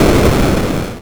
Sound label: explosion wide